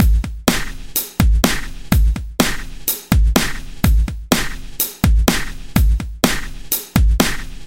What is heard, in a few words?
Breakbeat Drum; 01 Drums